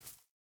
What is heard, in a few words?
footstep hide grass jump start; Rust